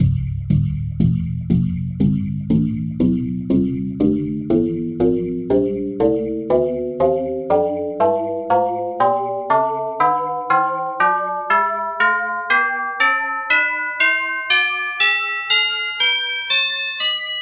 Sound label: tubular bells tonleiter